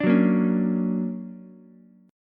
D Augmented Major 7th, down, Ukulele; D+M7 strummed; JGuitar

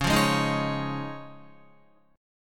C sharp Major Add 9th C sharp down Guitar; C#add9 strummed; C#add9 chord; JGuitar

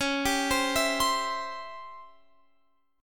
C sharp Minor Major 7th Flat 5th Guitar Open F; C#mM7b5 arpeggiated; x,20,19,19,19,19; JGuitar

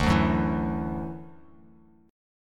D-Major Add 9th D down Bass Standard

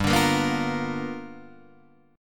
G 7th Sharp 9th G chord, Guitar Open D

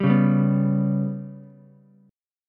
B Suspended 2nd Sharp 5th down Ukulele; strummed; JGuitar

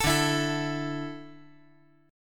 D Suspended 2nd D down

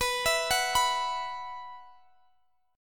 B Suspended 4th, Guitar, Open G

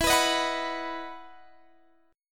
E Major 7th Suspended 2nd E down Guitar Standard; strummed; JGuitar

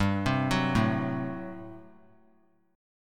G Major 7th Suspended 2nd Suspended 4th; GM7sus2sus4 arpeggiated

